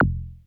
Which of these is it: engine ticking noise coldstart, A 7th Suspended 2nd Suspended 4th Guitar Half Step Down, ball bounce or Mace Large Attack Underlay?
ball bounce